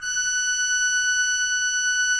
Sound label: F5, Cathedral Organ, HOLZGEDAKT; Propeller Island